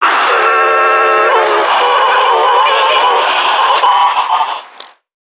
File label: Creatures Vocalisations Infected; Five Nights At Akers; soma; npc construct